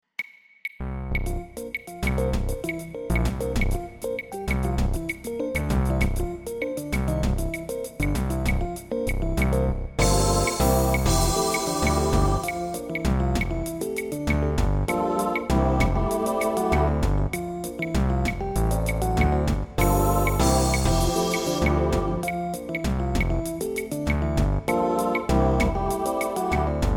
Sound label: Synth Bass; Yoruba Diasporas